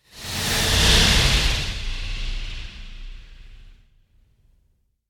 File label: Whoosh Flying Ghost By; Hollywood Edge; sound designer's toolkit v2